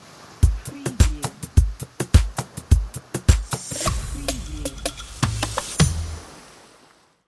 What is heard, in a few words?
6-8 pop, drum loop